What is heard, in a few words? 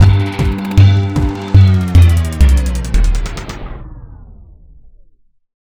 chorus time drop, 156BPM; Pony Fan Music Remix Stems